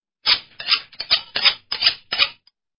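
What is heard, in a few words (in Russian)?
звук заточки ножа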